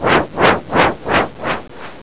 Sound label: wings; Battle